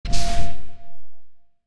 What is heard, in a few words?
Object Dungeon Gate Open; Dungeon3